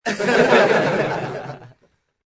Crowd Laugh, Small to medium crowd laugh. Studio audience laughing, comedy.; Studio audience laughing, comedy. Version 3.; Laughter